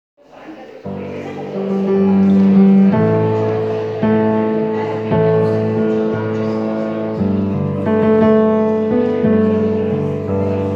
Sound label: Bass